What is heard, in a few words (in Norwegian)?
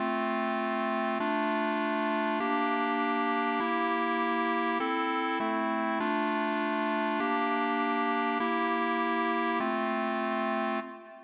klarinettversjon